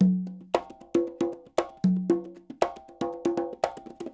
SHUF, Percussionworks; Sampleheads; NYC Percussionworks

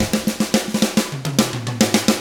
110 SAMBA, Drums; ILIO; Double Platinum Drums 1

